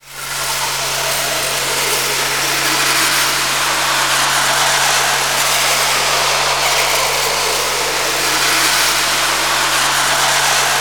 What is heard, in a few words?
JUNGLE; Sound Gallery - Stack Ware